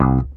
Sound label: Single Acoustic Basses